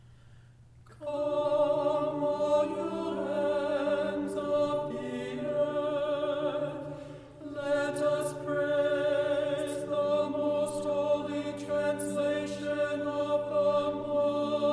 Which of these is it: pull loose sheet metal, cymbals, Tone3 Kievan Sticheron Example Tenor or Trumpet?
Tone3 Kievan Sticheron Example Tenor